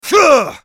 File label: spellcast effort; Yasuo; en US